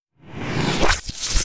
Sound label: Orb Smith End Forge; A Robot Named Fight